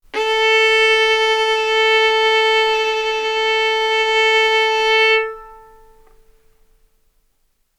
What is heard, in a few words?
Violin arco mf sul A A4 B4 stereo